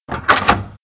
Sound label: Office Door Open; Playable Tutorial Level